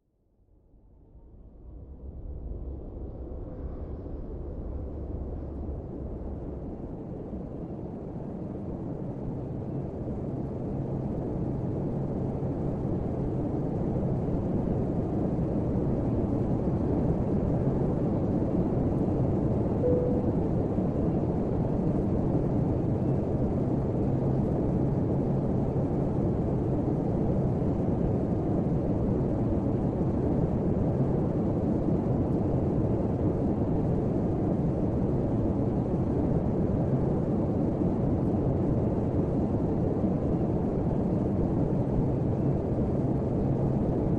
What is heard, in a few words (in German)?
flug, weisses Rauschen Flugzeug; 6 Stunden